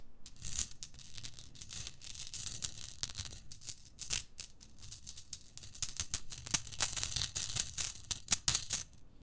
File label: jewelry clinking